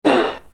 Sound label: wake up morning fart